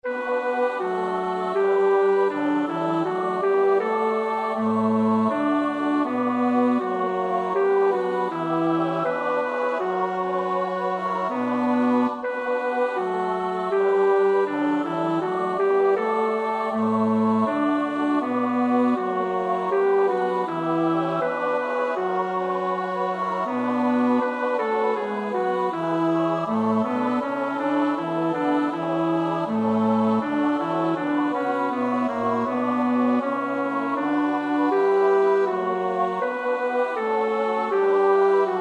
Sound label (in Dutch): bas, koorpartij oefening; Bach (Johann Sebastiaan); BWV 103